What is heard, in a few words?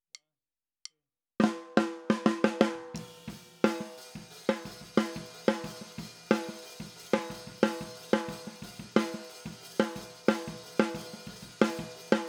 SNR